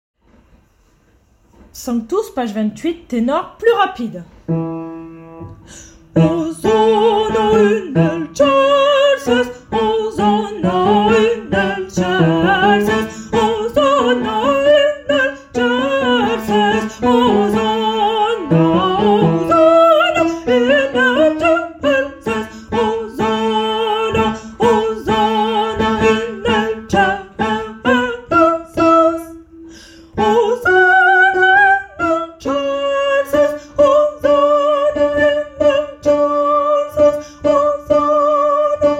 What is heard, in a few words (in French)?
tenor rapide; Santus